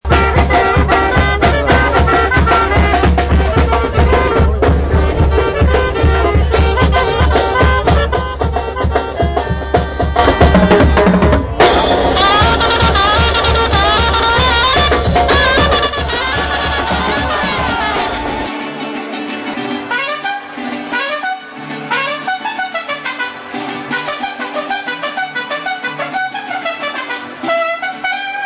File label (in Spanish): TAMBORA Y MARIACHI